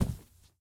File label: carpet step; Golden Crayon Sounds